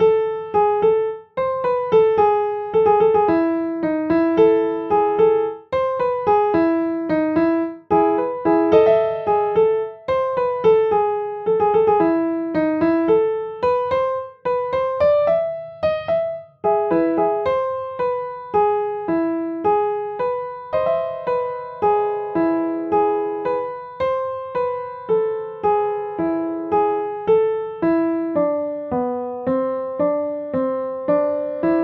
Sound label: goodtimes melody